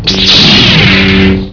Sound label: Sabre on